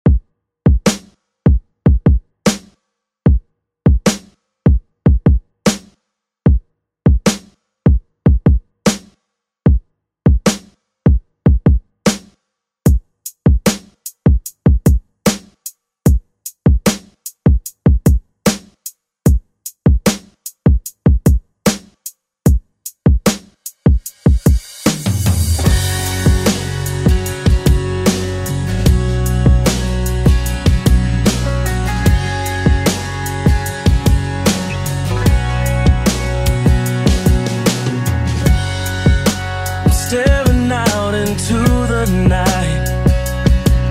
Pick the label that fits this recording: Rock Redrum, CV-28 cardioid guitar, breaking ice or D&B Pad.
Rock Redrum